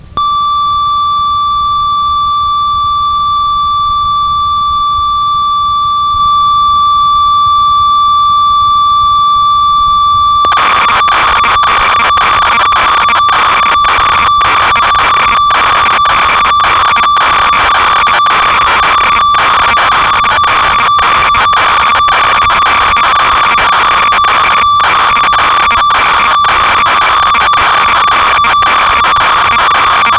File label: ob6, synth